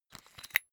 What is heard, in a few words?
umbrella telescopic extend